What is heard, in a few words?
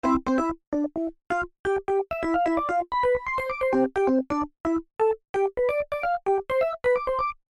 riff